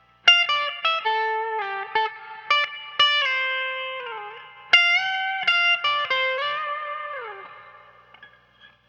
Lead Guitar, African; Sonic Foundry (Sony Creative Software); Continental Drift Aftershocks

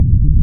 MASHEEN, MACHINES; Rarefaction; Poke in The Ear With A Sharp Stick

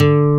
NYLON D 3; AKAI S-Series CD-ROM Sound Library VOL-1

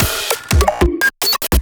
Bonky BPM148

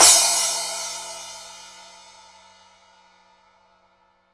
Hi Crash, EMU PX-7 Drum Machines

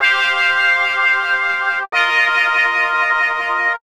Rawstab C, Keys, Nu Jazz House, 125; PowerFX - Nu Jazz House

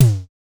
DSM2 Tom; MPC DSM-2

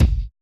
kick, Roland MC-909

